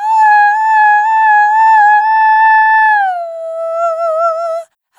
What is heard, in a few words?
Servitude Dry, vocal samples; Sonic Foundry (Sony Creative Software) - Pocket Diva World Class Vocal Samples; Op-Erratic Loops